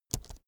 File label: keyboard laptop space press slow soft